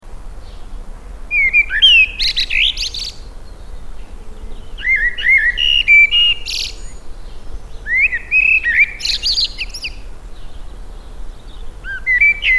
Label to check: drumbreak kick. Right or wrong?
Wrong